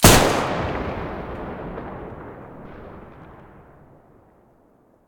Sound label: toz34 hole mono, weapons